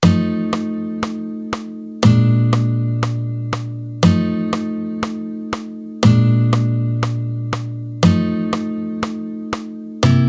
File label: Shell Voicing, jazz guitar; jazz guitar harmony shell voicing